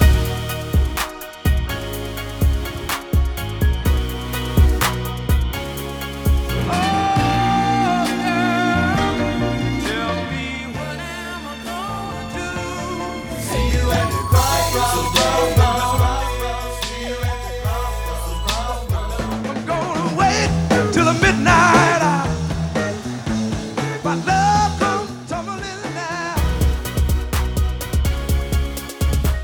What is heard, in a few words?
Music; UNSUNG S7